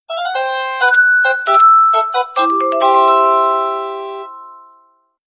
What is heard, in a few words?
ringtone